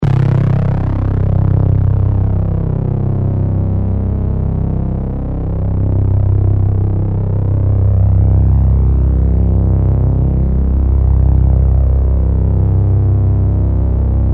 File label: Scary Bass Drone, Deep and heavy ominous bass drone transition.; Drones; Sound Design